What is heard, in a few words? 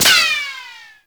Sniper Ricochet; Warframe; Frumentarius